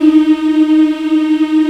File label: M D#4, CHOIR; Spectrasonics; Heart of Africa 1; CHOIR MMS